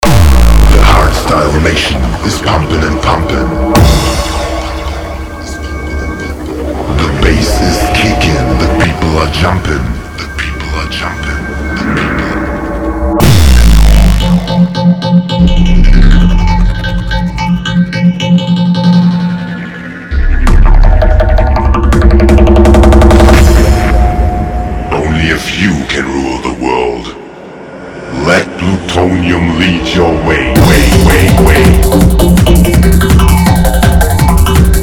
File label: Hard bass